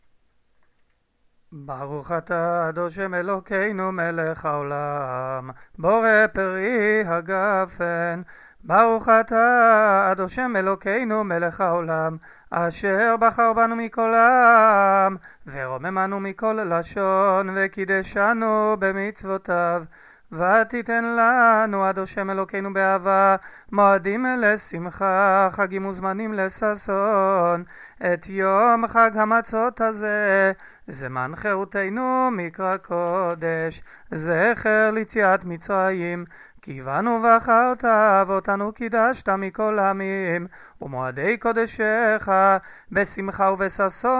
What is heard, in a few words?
kiddush night; kiddush 3 regalim